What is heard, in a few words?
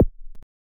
hit; engine-demo; jsr-clone; tefek.srclone